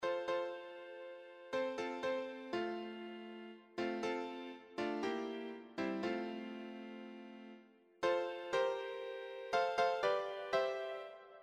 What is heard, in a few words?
piano